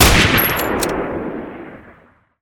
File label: weapon; KR98